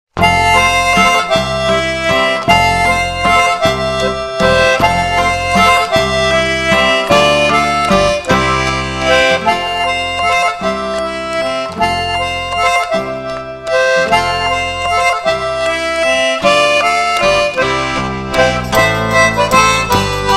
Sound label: concertina